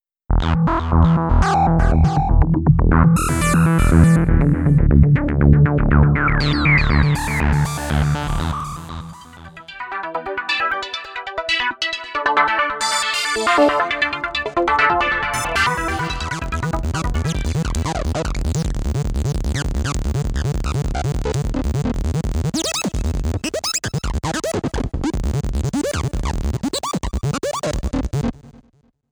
Arpeggio

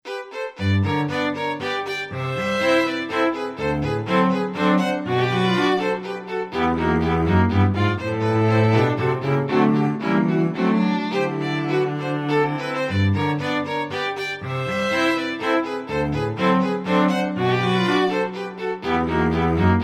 Strings

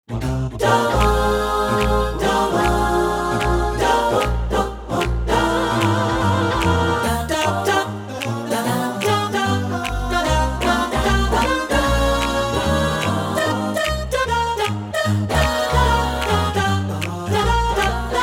Scat Voices

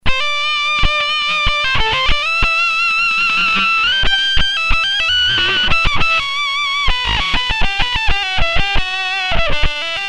Octave fuzz

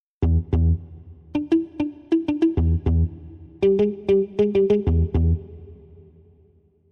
two mute

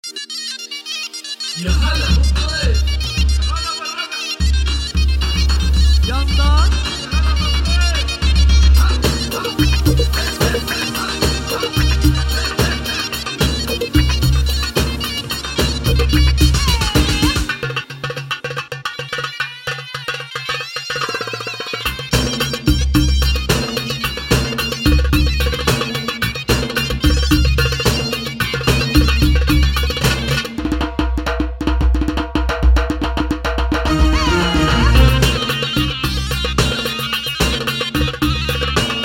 dabke

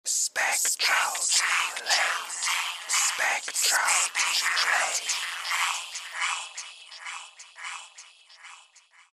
stutter effect

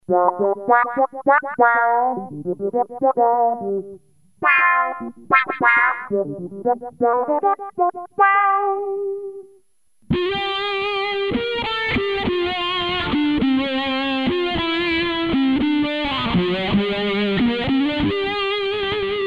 autowah

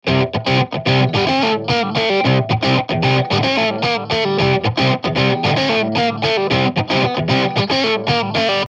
Catchy Rock

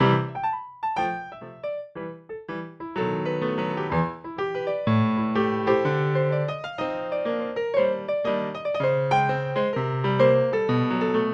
Grand Piano

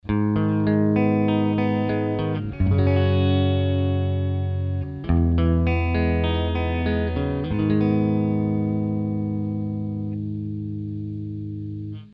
slow speed

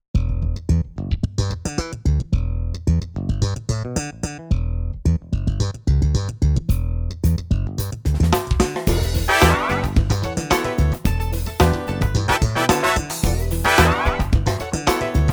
Funky

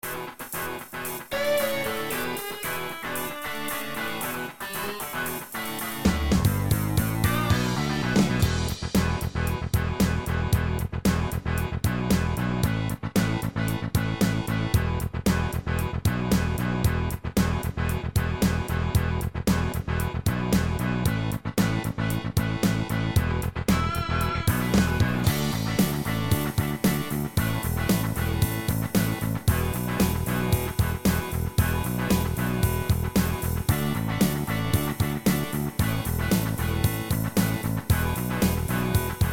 rock

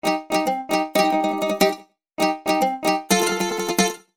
Mandolin Trem FX

